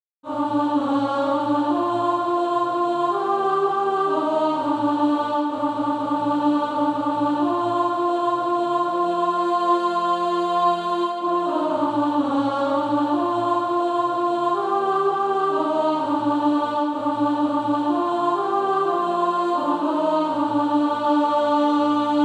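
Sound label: alto, Practise Music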